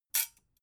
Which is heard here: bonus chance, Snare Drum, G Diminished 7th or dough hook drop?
dough hook drop